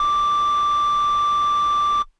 Air Bass Kawaii D5; keys

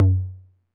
Tom Low 808 Sat, Saturated

